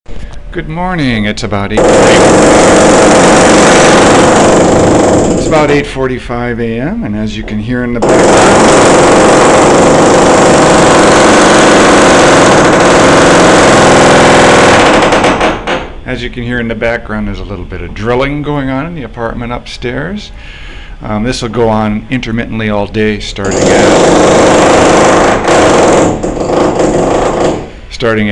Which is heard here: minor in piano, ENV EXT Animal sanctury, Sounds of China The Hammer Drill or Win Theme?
Sounds of China The Hammer Drill